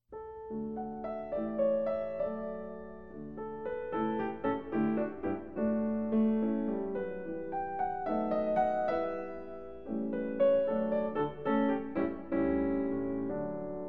Piano Sonata In D Major, Menuetto, Allegro; Caio Pagano; Beethoven

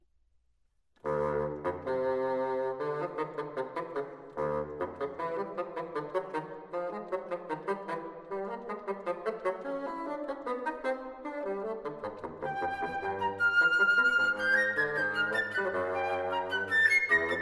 Concertino For Trombone & Woodwinds, Toccata

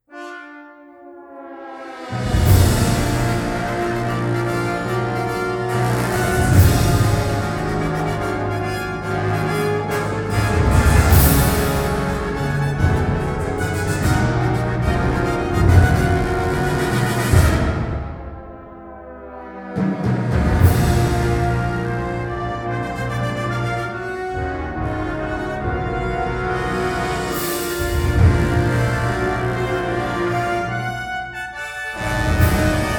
Fanfare

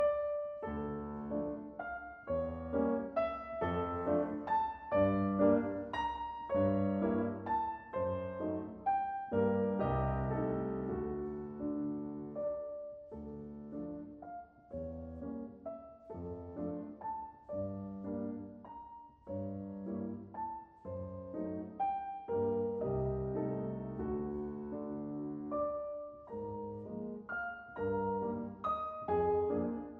Waltzes, For Piano, four Hands, D Minor; Brahms

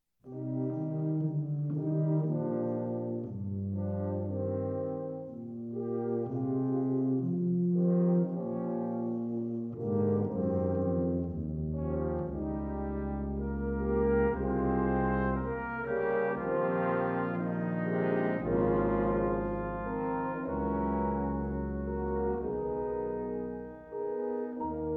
Concertmusic for Piano, Brass, and Harps; Mvt. 1. Ruhig gehende Viertel - Lebhaft

